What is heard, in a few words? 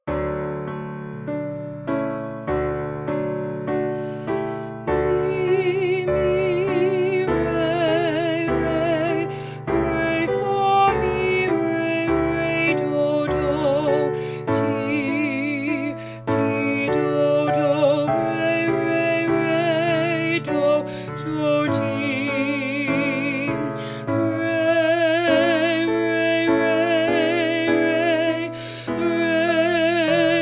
alto solfege; mozart; ave verum